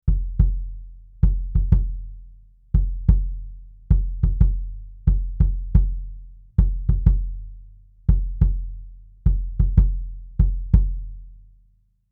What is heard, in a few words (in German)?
Bass Drum Niere; sE Electronics T2